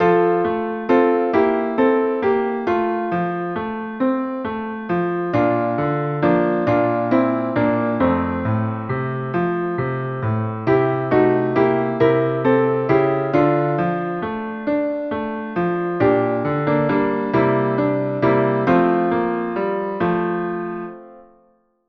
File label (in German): Klavier